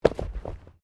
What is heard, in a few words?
Body movement Impact Roll